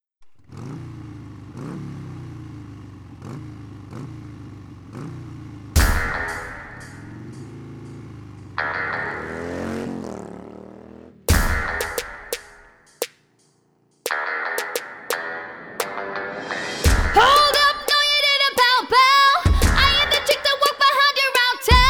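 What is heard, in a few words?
Female Vocal Harmony Trio; Girl Project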